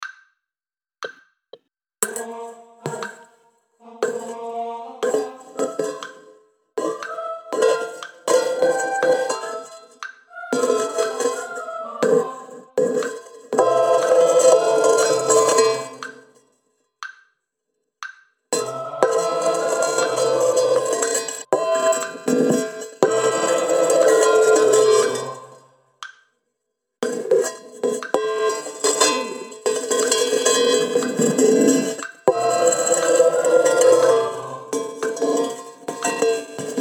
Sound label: Vc Backing Click